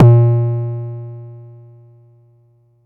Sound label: Bassdrum, Jomox Xbase09